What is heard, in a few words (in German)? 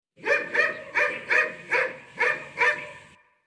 hund